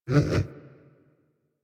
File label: Tame Idle Sit Scratch Moan; Enemies; Warframe